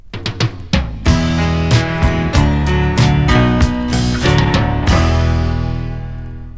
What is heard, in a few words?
POP; L'Effet Pommier - Volume 04; MUSIC